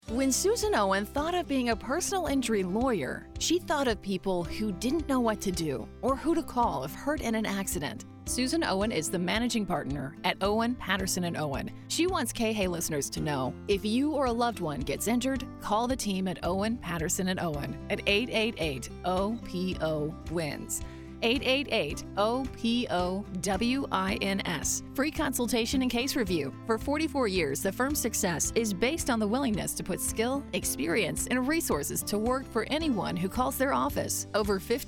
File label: music bed; KHAY OPO LAW